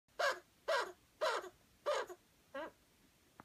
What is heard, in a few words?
Chinchilla; چینچیلا